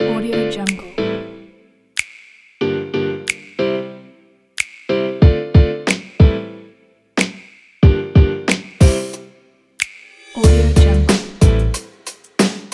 rnb neo soul

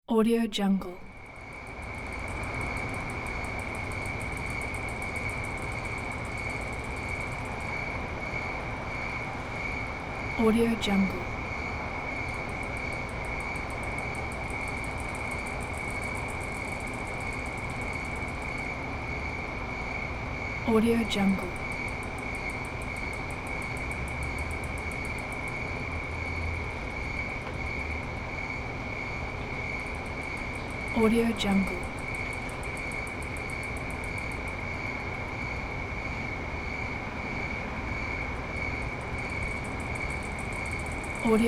night city street

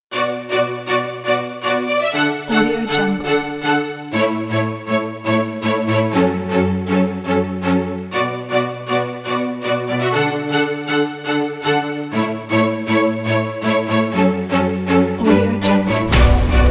epic trailer

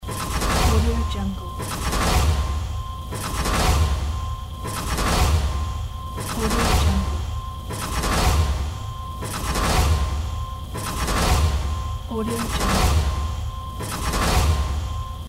robot walking

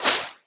roman candle pass by; prosoundfx

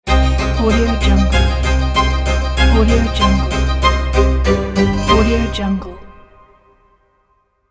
dramatic baroque logo

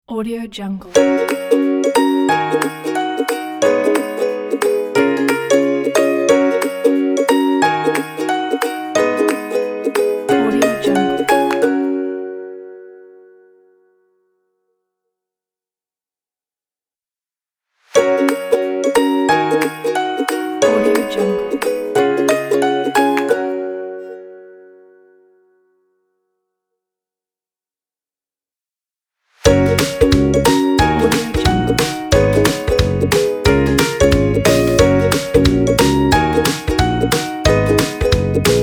happy logo for intro

